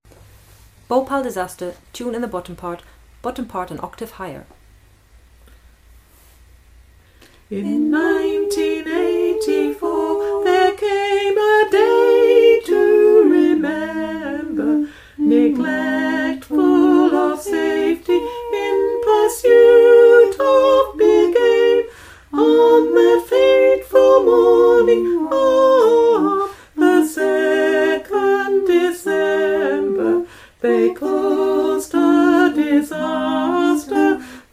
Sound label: tenor